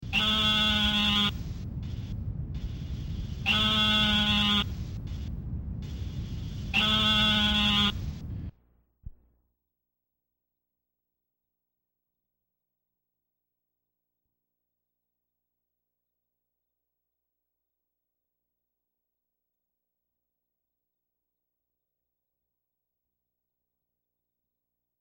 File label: Eastern Narrowmouth Toad